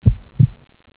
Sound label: heartbeat